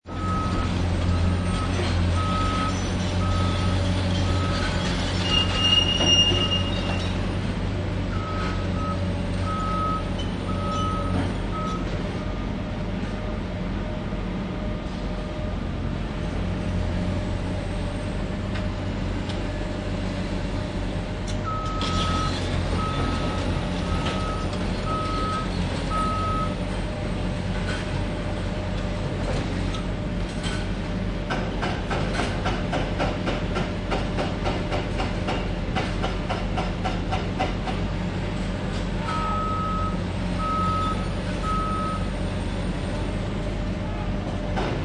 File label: Ground Zero Construction, construction site; Ground Zero - NYC, construction site at location of WTC tower 2, heavy building construction, constructing foundations